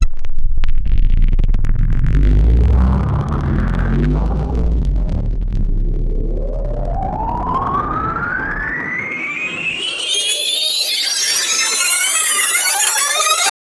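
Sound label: futuristic swipe transition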